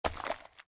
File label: Ink Land; Fancy Pants World 1 Remix